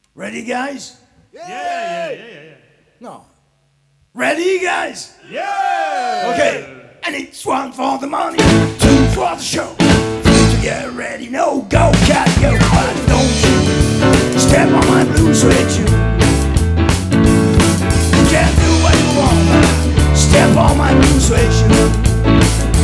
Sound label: blues